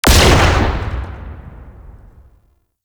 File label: Modular Secondary Barrel Fire, Weapons